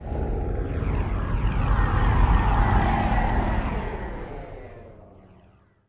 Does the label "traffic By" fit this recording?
Yes